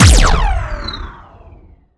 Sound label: Sciweap Shot Large Laser Astral Disintegrator